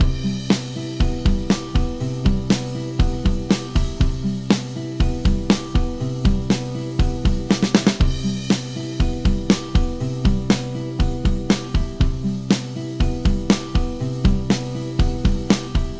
waves EQ ratio max out down

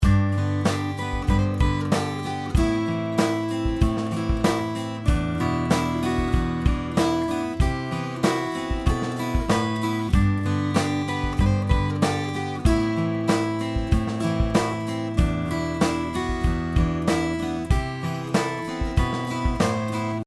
MLX67 wa73

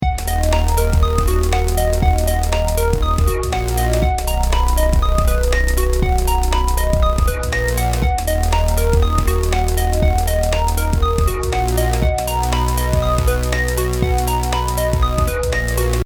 celesta bass

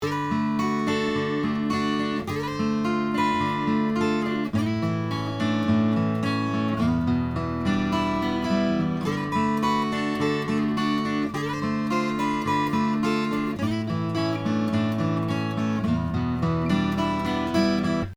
MXL144R solo

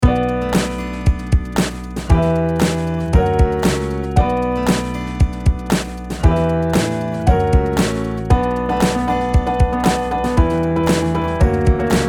arturia piano

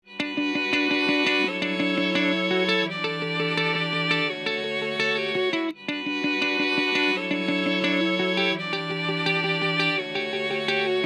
CHANDLER LIMITLED TG2 500 IMP1200

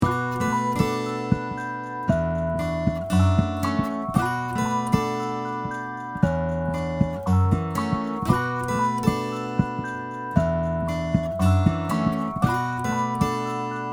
AKG C451B

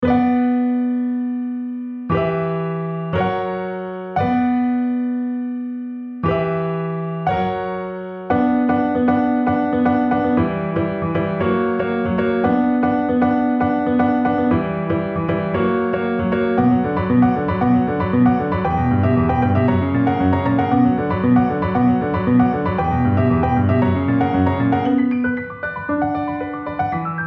far reamp drum set

